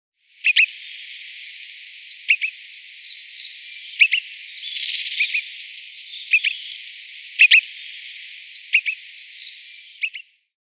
Pine Grosbeak calls

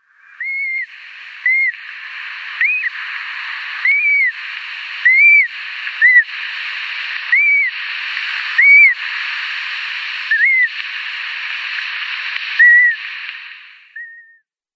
Short-toed Eagle nestling

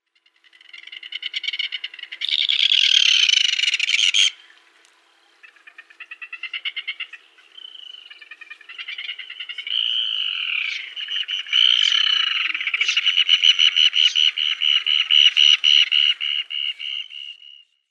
Red-billed Tropicbird

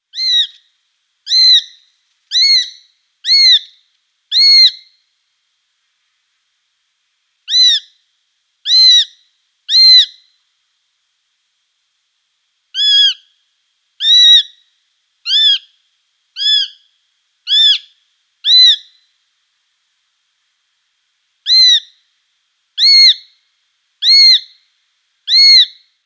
Sparrowhawk nestlings

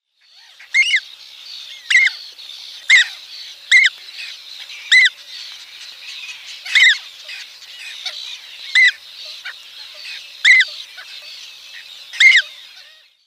Black-headed Gull downy Young

